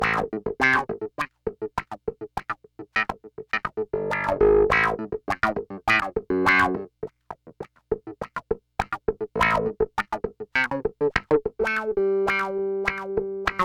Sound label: Clav Rhythm, Key of A, 102.60BPM